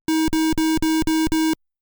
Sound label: Empty Warning Sound